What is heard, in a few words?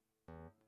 guitar nice